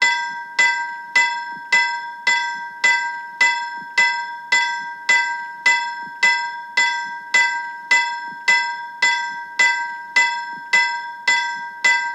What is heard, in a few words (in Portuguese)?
Sino de Locomotiva